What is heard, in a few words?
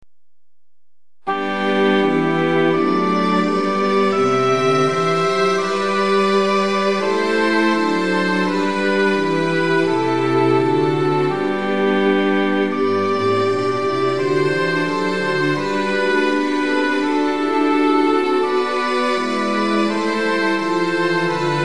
Midi Theme Ob Ob EH Fg VC QN 84